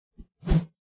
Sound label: melee attack swoosh heavy, weapon swooshes; psfx